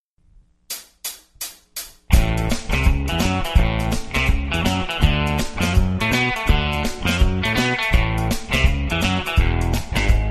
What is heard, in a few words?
Rock guitar; yao gun ji ta